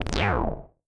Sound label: slime monster